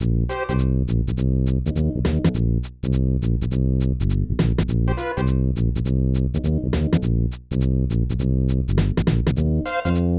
Rainforest; Super Ninja Boy

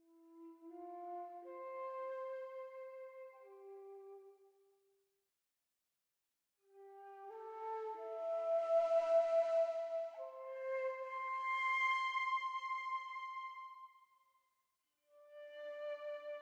Night Flute; Sky Children of the Light gamerip

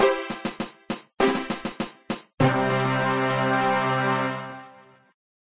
Woodcutting level up

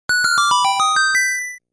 Puzzle Solved Jingle; legend of zelda the link's awakening

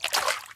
water

